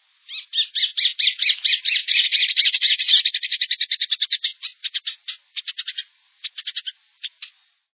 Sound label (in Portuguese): joao de pau